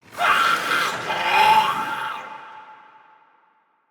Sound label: Death, Barks, Battle, Soldier; Mendicant